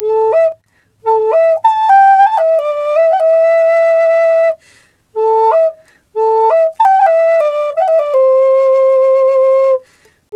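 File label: flute, 93 BPM; Big Fish Audio - Didgeridoo and Other Primitive Instruments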